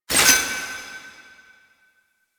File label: Crystal Pickup; UI; Pickups; Citrine; Warframe